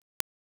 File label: 1 bit error